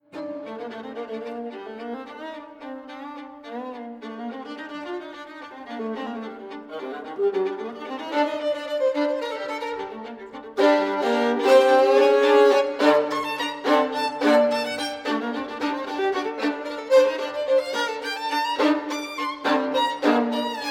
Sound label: String Quartet